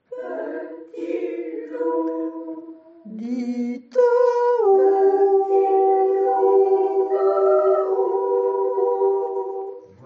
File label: tutti